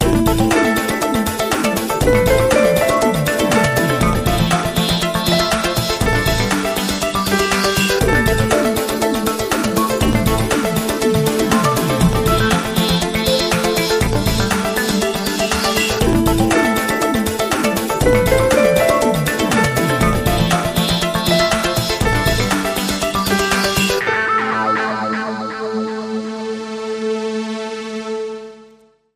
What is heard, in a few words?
Hard harp